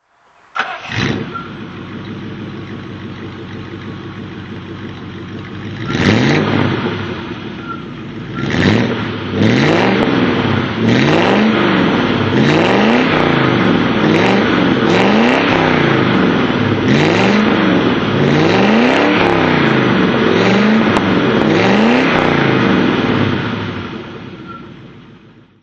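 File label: triumph stag throttle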